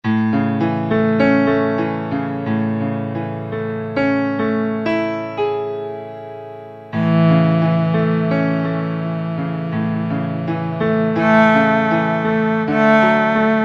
Piano-Cello; Kurzweil K2000 V3

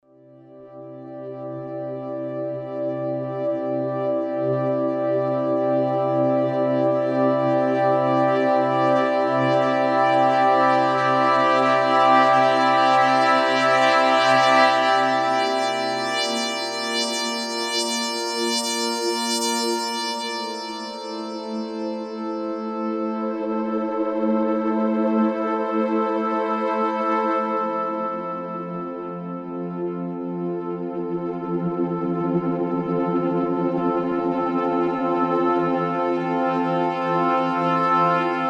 Awesome Pad; Novation UltraNova; Factory Patches

